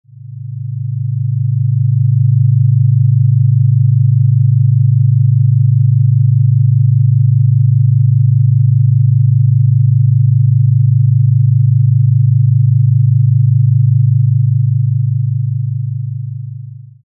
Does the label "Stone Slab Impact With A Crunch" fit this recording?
No